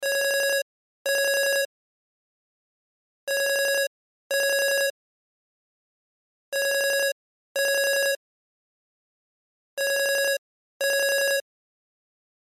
Cell Ringtone; Loop; Cell Phones; Blastwave

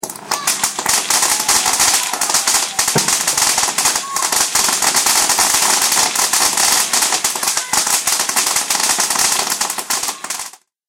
Fireworks Firecracker With Children Shouting

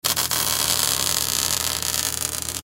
Welding Gun Spark; Welder; Welders; Blastwave

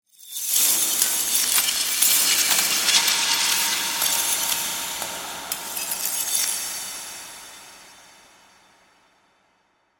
Glass Reverse, Glass, Reverse, Shatter, Dark; Strange Tone

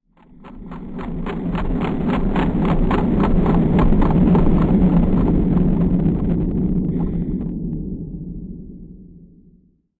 Toxic Dust Clouds Sf Ambience

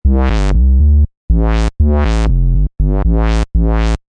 SYNTH BASS 120, Synth bass loop 120 bpm; Royalty Free Bass Loops; Music Production Loops

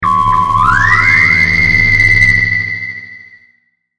Ufo, Bean, Ray, Vintage, Science Fiction

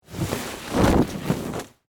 Canvas Tarp Shake, Foley, Canvas, Tarp, Shake; Rustling Sound Effects; Objects

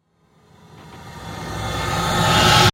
Riser Suction; Ascends; Sound Design

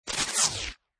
Static Hit Laser Shot; Scifi Production Elements, Set 1

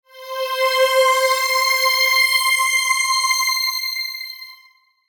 SHIMMER STRING, Shimmer Strings Swell Flange; Blastwave

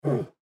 Lion Breathe Out; Leopard Sound Effects; Animal

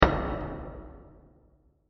Deep Metal And Wood Impact; Blasts; Impacts; CraftedSignals

